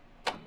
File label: Ato on, Train; OpenBVE